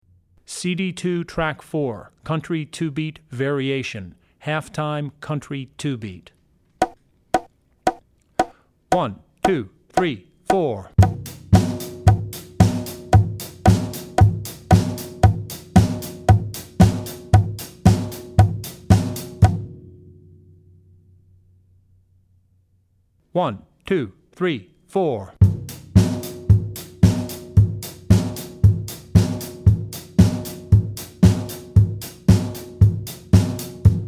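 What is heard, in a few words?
Country - Two Beat Var, Drum Tracks; Country Two Beat (Variation 2); Drummer's Bible Tracks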